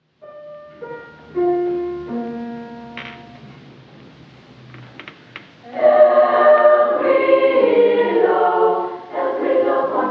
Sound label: Madrigal Choir; El Grillo; Josquin des Pres; Sen Madrigal Choir